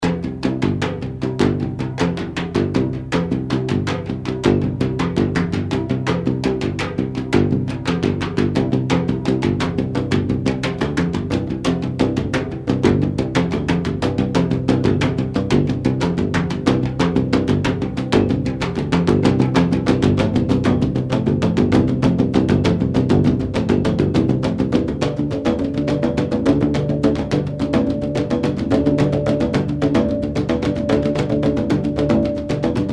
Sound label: Slap Tubes Bass Slap